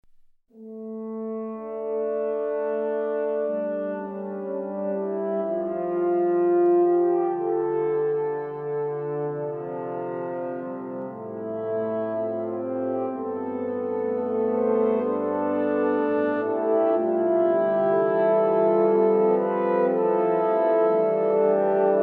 Horn; Doodles; Horns B: (Renaissance)

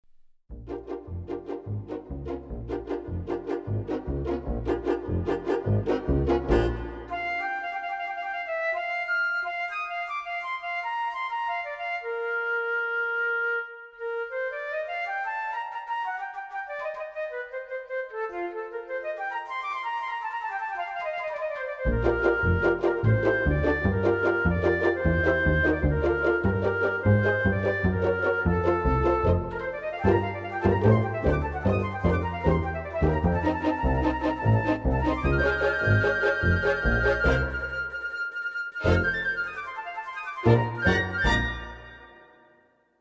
Flute; Doodles

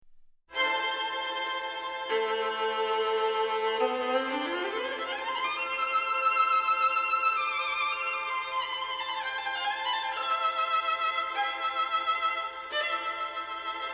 Violins Rhapsody; Doodles

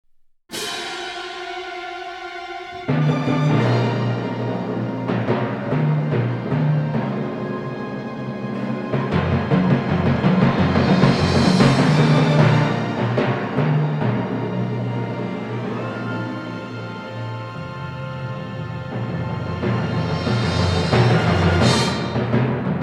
Timpani; Doodles

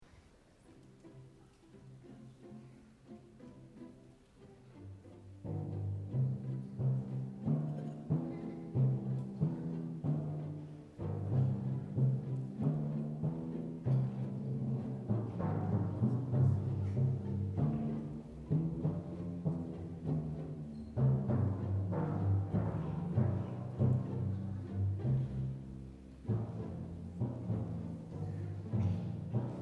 Timp Strings; Doodles; Timpani with Strings pizz.

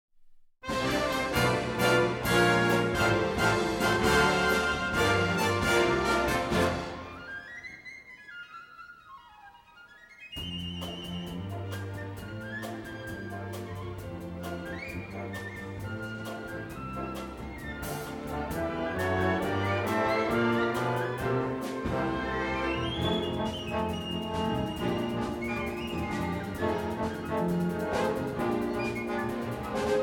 Piccolo; Doodles

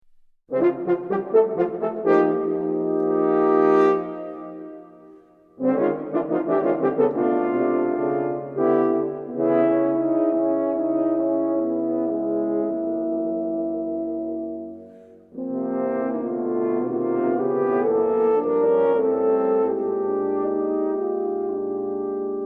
Horn C; Doodles; Horns C; (Trio)

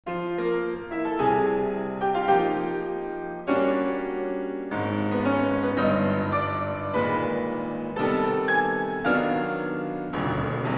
Suite for Piano; 1st movement Excerpt; Suite for the Piano

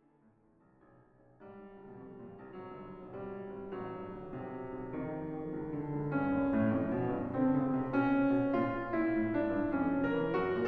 Suite for Piano; 4th movement Excerpt; Suite for the Piano

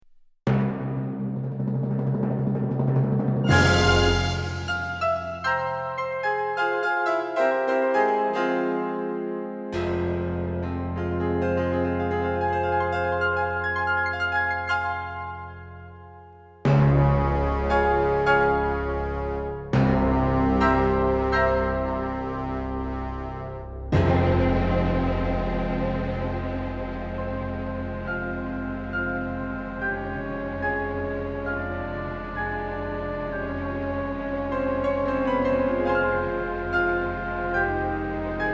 Piano; Doodles

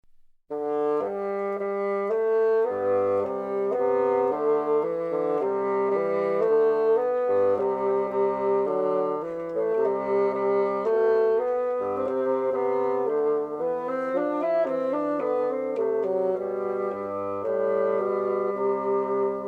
Bassoons; Doodles; Bassoons (Duet)

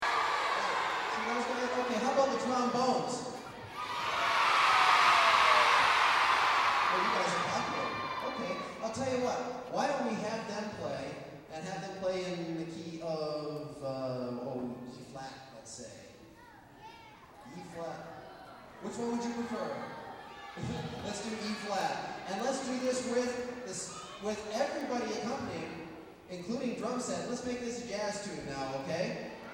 Brass; Doodles

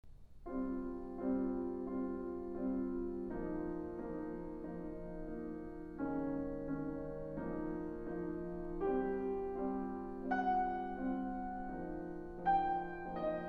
Suite for Piano; 2nd movement Excerpt; Suite for the Piano

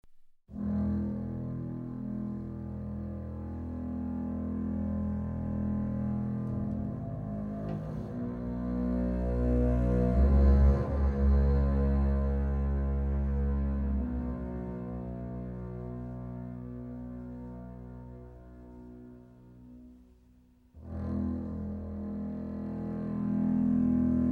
Basses Section; Doodles; Double Bass C: (Tutti - Othello)

